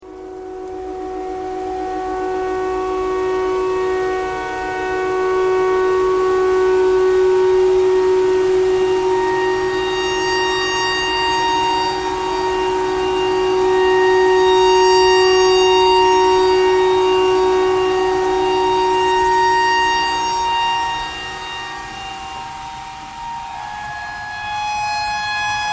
tornado siren tornado siren thread pentatonic

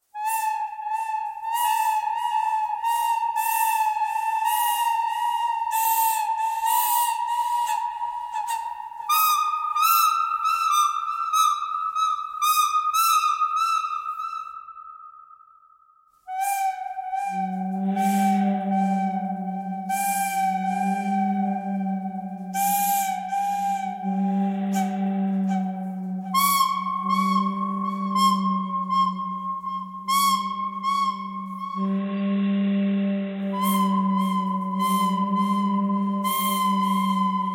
bird canyon thread harmonic

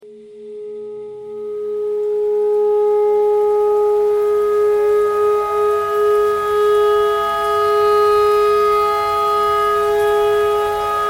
tornado siren clarinet notes e pentatonic

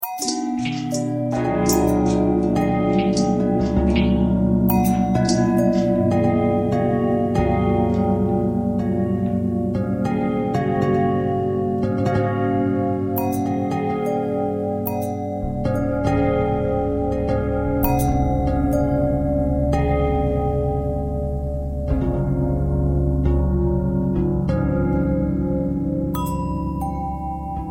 bell long bell thread pentatonic

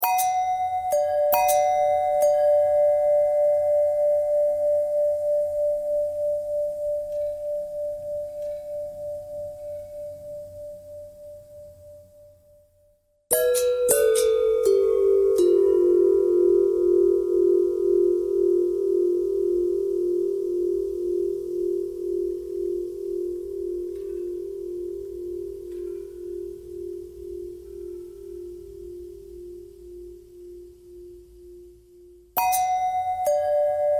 long bell long bell thread pentatonic, bells

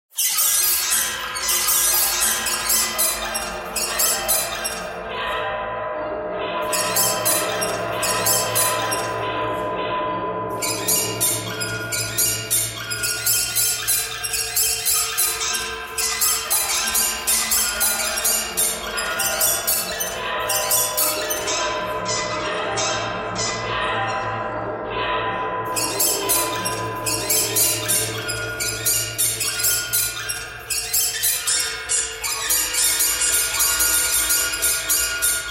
birds birds thread buzz

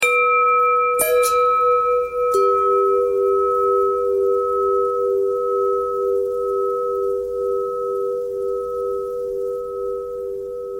long bell bell threads, bells

